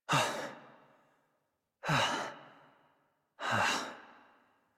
Breath Heavy Slow Operator Voice; New War

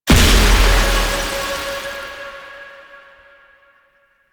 Crystal Growths Impact; Warframe; Citrine

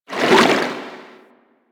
Shark Tail Move Swim; Warframe; Grineer Ocean Shark

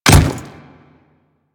Royal Guard Default Step, Footsteps; Warframe; Grineer Royal Guard

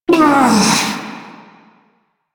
Struggle Short; New War

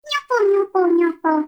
Baby Vocal Tired; Warframe; Nokko Colony

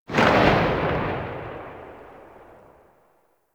Glass Shotgun Tail A; Warframe; Tenno; Weapons

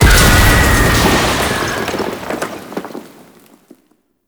Elevator Explosion; Warframe; Tau; Circles Of Hell

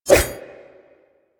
Kunai Whoosh

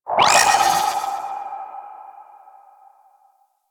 Mine Guided Warning Pulse; Enemies; Abilities; Warframe; Corpus; Arachnoid Camper Terra

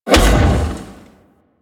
Rocket Explosion; Warframe; DE; Vehicles; RailJack; RailJackEnemyShips; Grineer; Space Fighter Dredger Agent

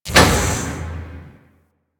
Cave Hanger Dock Light On; Warframe; Ambience; Corpus; Crp Cave Hanger

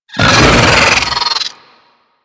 Pet Vocal Angry

